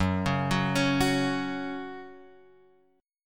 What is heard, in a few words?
F sharp 5th F sharp Guitar Open F; arpeggiated; JGuitar